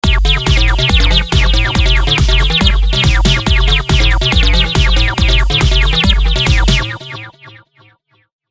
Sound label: no lfo